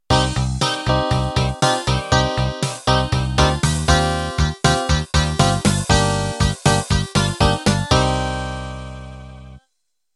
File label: Country Rock End